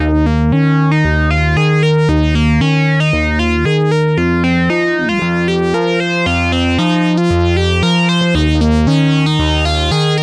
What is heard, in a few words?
Arturia MiniBrute2 BRUTE